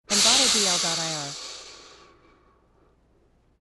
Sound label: Alligator Hiss; nature sounds